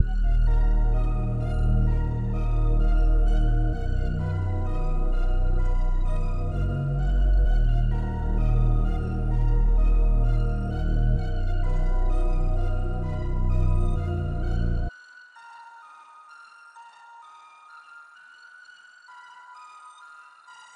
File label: 129 BPM Layered; Order